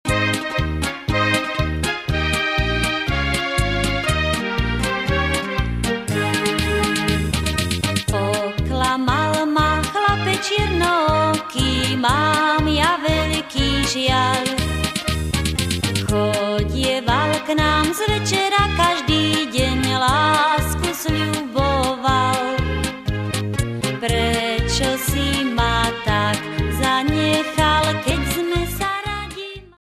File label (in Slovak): akord